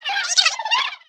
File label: Attack; Noblegarden